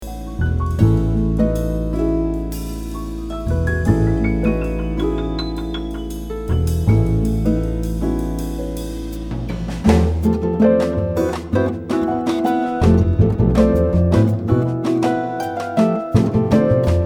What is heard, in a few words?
Rondo for Harp and Drum